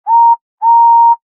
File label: whistle